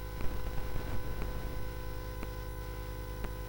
sid CSG8580R5; California Games

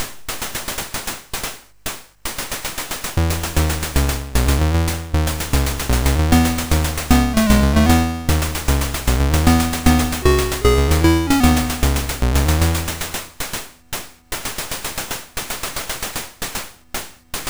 sid, MOS6581R4; Classic Concentration

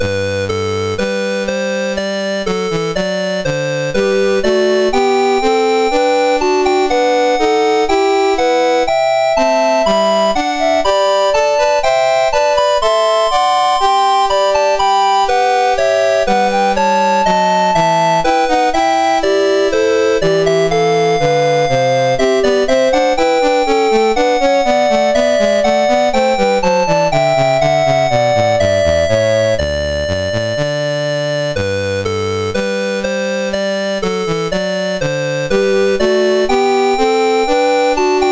Variation, MOS6581R4; Variation 9; Goldberg Variations

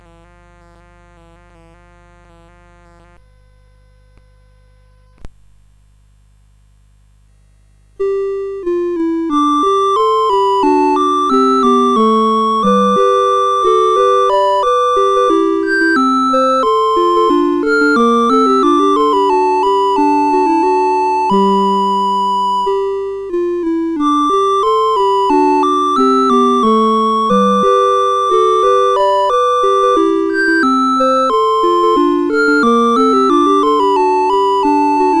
CSG8580; CSG8580R5

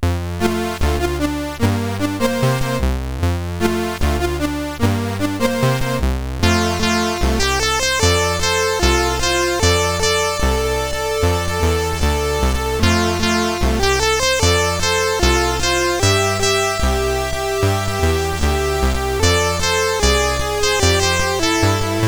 See-Saw, CSG8580; CSG8580R5

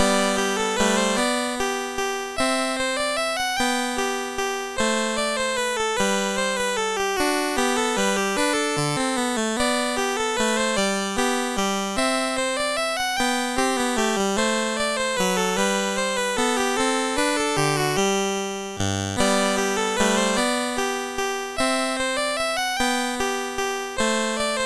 Minuet in G, MOS6581R4

